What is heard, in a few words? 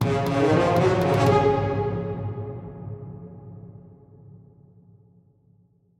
god level norse; Holy Potatoes! What the Hell?!